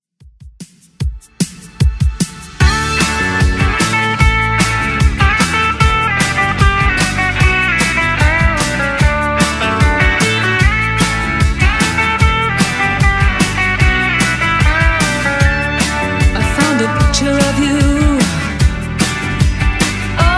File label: 80s Redrum 126bpm